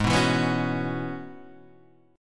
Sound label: E 6th Add 9th G sharp